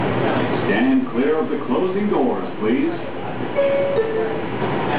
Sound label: NYC Subway